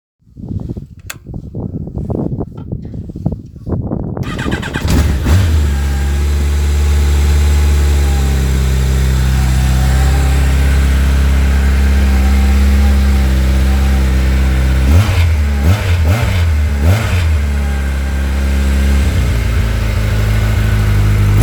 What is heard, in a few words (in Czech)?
Xjr1300 po uprave, yamaha xjr